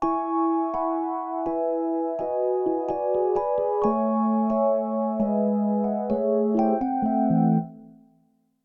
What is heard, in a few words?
Rhodes; XV-88